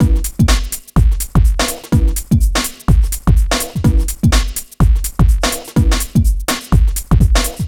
Full Loop 125, Loungin House; Equipped Music

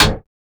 CYBER KIT, DRUMS

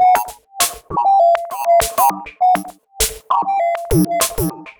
Robo Beat 100BPM, sci-fi Beats

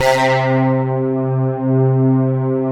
MW LOW C3

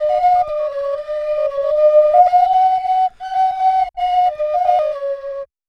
Tropical Flute; Sonic Foundry (Sony Creative Software); Ma Ja Le Ethereal Textures; Flutes

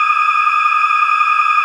Steady State, Keyboards

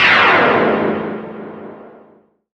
loop; X-Static Goldmine 3

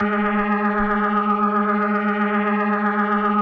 G#3F, B3, Vintage Keyboards

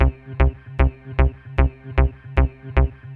Teck Pulse, Bass; Sonic Foundry (Sony Creative Software); Synchro Funk

